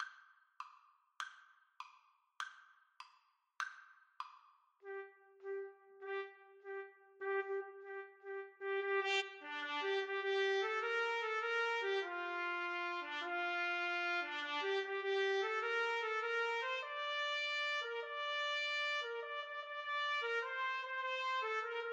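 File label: when johnny trumpet duet karaoke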